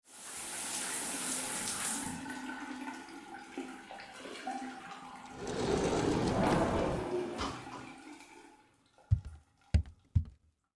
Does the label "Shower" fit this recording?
Yes